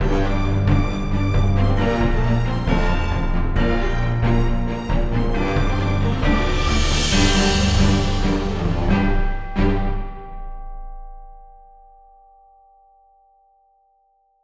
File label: Quiet Symph